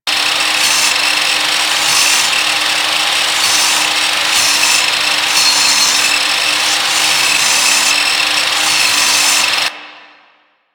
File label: Saw Blade Loop